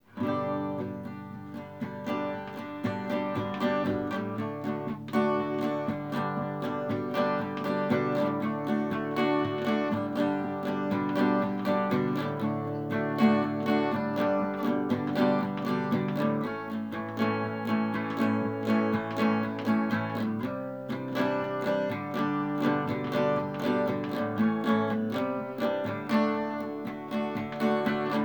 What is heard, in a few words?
acoustic; foreign auto computer